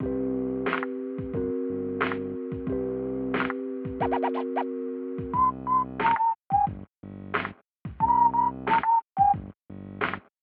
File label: Aircheck, Phono